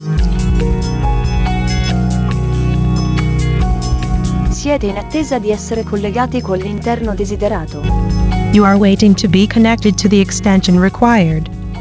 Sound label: Attesa Ambient Ita Eng